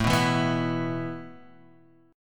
A Suspended 2nd A Guitar Half Step Down; Asus2

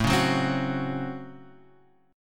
Db Major 7th Suspended 4th Sharp 5th A

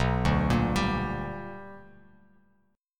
B Minor Major 7th Sharp 5th Bass Standard

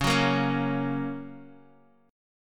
F sharp Major C sharp

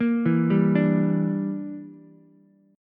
E Minor 7th Flat 5th E, Ukulele; Em7b5 arpeggiated; 3,4,3,5

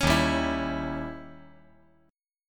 D sharp 7th Flat 9th; strummed; JGuitar

